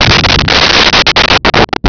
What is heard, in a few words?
explo muffled